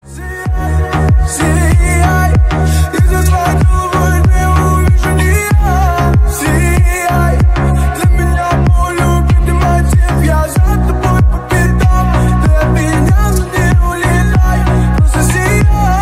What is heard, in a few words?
remix